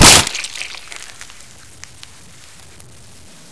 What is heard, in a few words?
baby attack